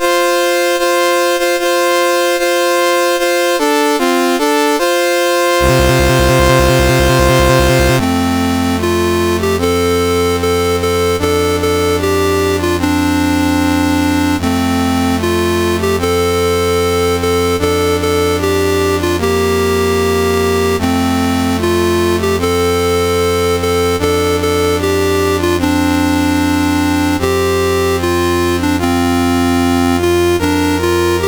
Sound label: Central African Republic National Anthems, CSG8580R5